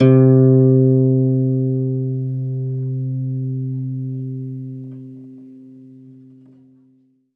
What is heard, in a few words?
ZITHER, Alpine Volksmusik; Best Service